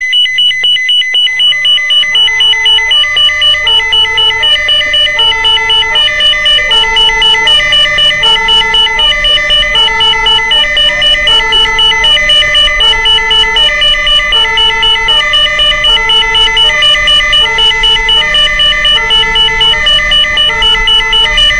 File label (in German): Feuerwehr